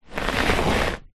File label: tub storage large plastic drag carpet; foley